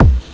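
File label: Kick with Hash, One Shot Drums; Drum & Bass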